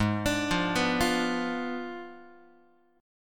G sharp Diminished 7th G sharp, Guitar; G#dim7 arpeggiated; JGuitar

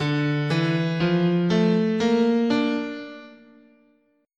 D Hirajoshi, scale

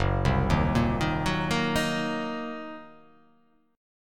Fsharp 6th Flat 5th down, chord

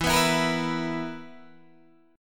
B Major 7th Suspended 4th E

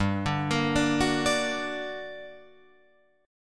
G Minor Major 7th, Guitar, chord; GmM7 arpeggiated; 7,9,10,9,9,9; Open F; JGuitar

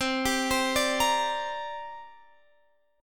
C 7th Suspended 2nd

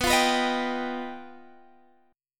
G Augmented 7th

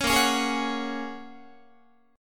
Bb Minor 7th Sharp 5th, Guitar; Bbm7#5 strummed

